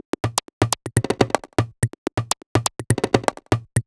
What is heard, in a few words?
Top 124bpm, House Drumloops; Zero-G House Fabrik; 90 s Sample CDs